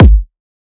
Kick, Drums

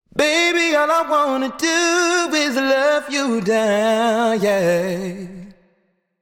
Sung Phrase Wet Baby All I Want 95 BPM F Maj; Cymatics; Euphoria Vocal Sample Pack

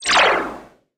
Collectibles Sci Fi FX; Video Game; Cymatics; Video Game Sample Pack Vol 1

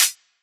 Close Hi Hat, dubstep; Drum Shots; GhostHack; Demolition; Venomous

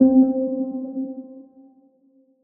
KEYS Liquid (C); Melodic One Shots; Cymatics; ANALOG EVOLUTION Beta

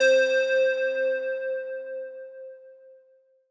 Juno-60 LEAD Narrow C; Synth; One Shots; Cymatics; Fantasy Synth Sample Pack

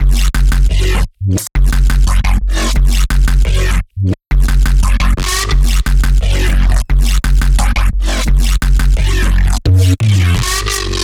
Drum & Bass Bass Loop 174 BPM F#; Synths; Loops; Drop Loops; Cymatics; EDM Starter Pack

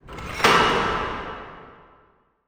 Lock Gate; Game Essentials

